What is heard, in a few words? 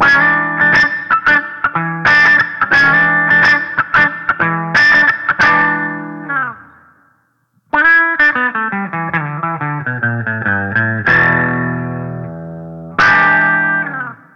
Clean